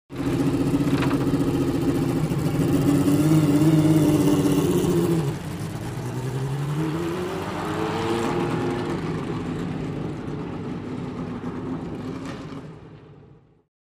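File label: Sports Car In Pit Away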